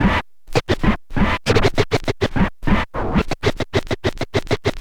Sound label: scratch short, Vinyl scratches